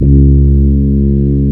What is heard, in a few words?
C#, Synclav FM, BRASS; Big Fish Audio